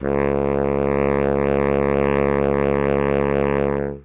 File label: notes c sharp, sax; Dirt-Samples